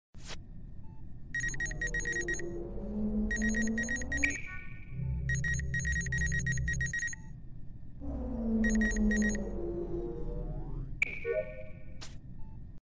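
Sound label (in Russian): сканирование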